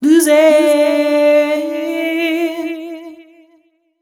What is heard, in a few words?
f 132 mel, Vocals, fem